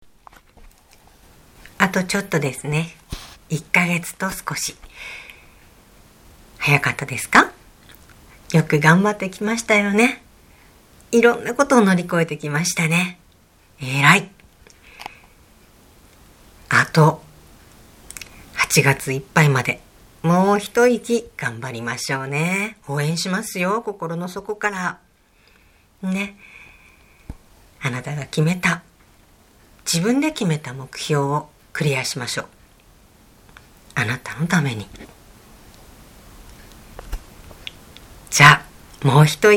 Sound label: yell